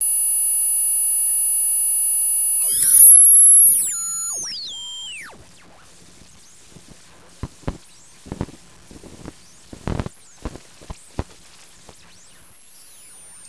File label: sweep